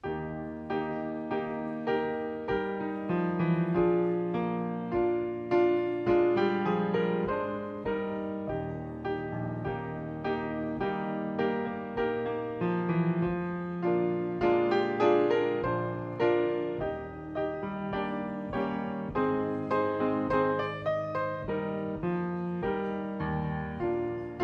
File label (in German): Vorspiel